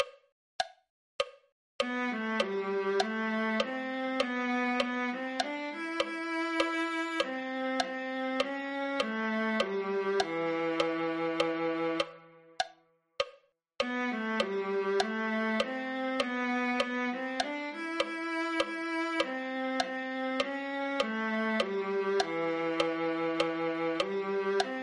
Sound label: viola